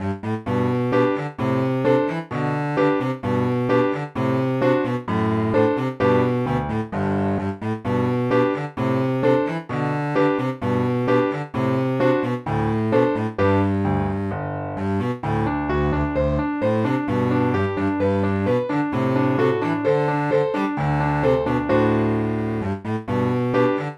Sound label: allegretto, cello; suzuki cello school